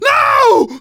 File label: grabbed by smoker, survivor voice; manager; Left 4 Dead 2; left4dead2 dlc3